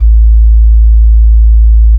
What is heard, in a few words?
sub A, techno trance bass